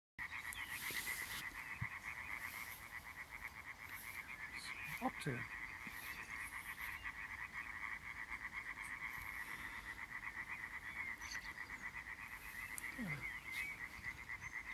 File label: hyla arborea